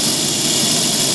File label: Grenade Loop, Machine Gun